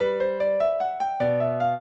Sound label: piano; Mozart